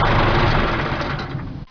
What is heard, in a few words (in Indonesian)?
suara mobil